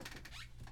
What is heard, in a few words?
Chair